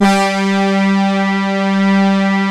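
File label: synth, Prophet Five, Analog; E-MU Formula 4000 Series Vol. 3 – Analog Odyssey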